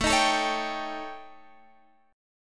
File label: D sharp 7th Flat 5th A